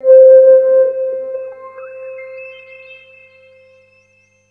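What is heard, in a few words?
synth, drum & bass, 140 BPM; Ueberschall; Drum & Bass Resonance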